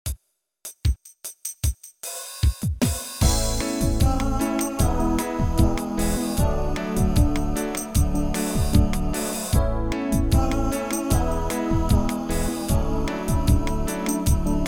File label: reggae music